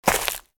pas feuilles; pas h feuilles

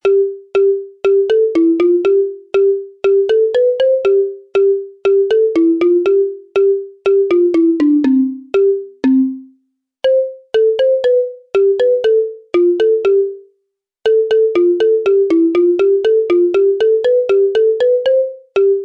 mélodie balafon; MOBICLIC 77; RATOCLIC

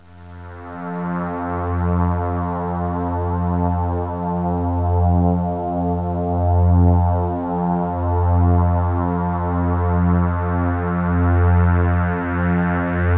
nappe; Interactif 3; rgbpower; culture

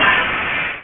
VERRE; Flashback Demo

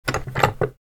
tiroir

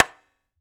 raquette; Ultranium 3D; Jade

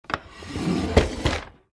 tiroir

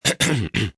gorge gratte; MOBICLIC 65

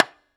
raquette; Ultranium 3D; Jade

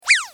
fleche

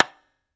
raquette; Ultranium 3D; Jade

